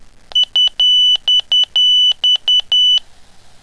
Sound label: Minitor 5 Tone